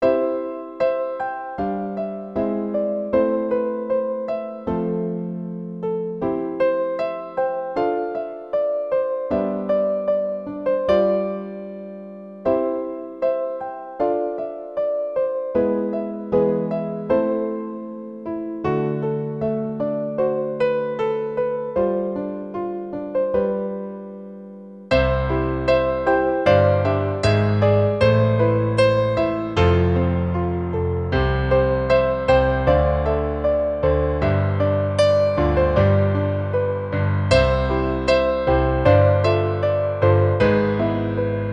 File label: Piano; The Rhapsody of Zephyr